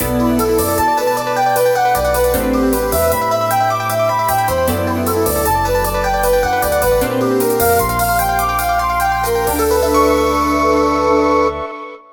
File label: Station Jingle; bayonetta 3